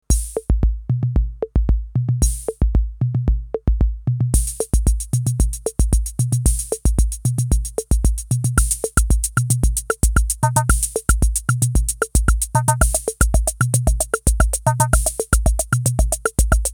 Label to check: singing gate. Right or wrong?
Wrong